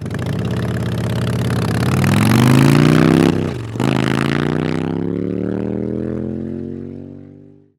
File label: accel